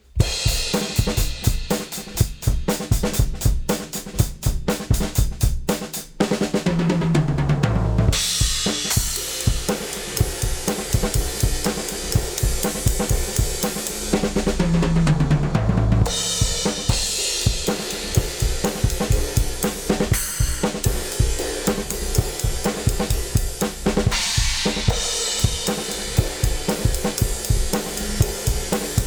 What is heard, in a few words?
drums; Pulsar 1178